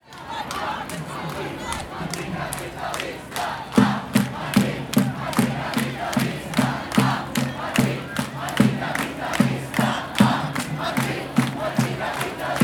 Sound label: Protests Anticapitalista; Collected Transients - We are the People; Ravenswood